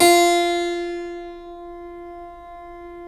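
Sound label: F 3 clavinet, Keyboards Acoustic; USB Soundscan vol.17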